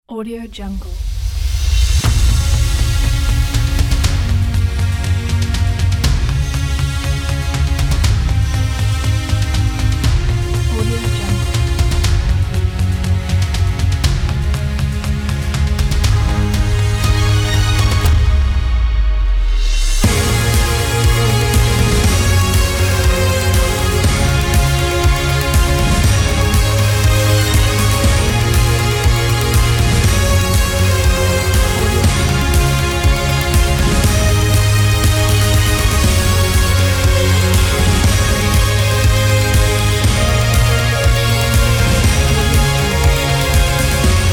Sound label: orchestral news theme opener; vizion studios